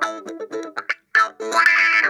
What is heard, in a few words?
box wah, funk guitar; Zero G - Funk Guitar